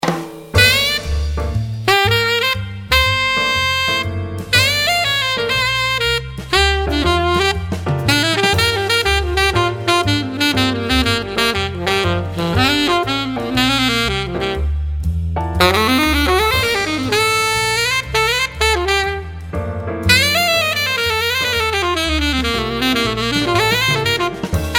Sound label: Alto Jazz; gottsu